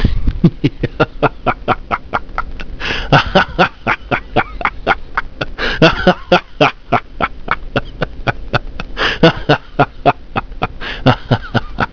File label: Laugh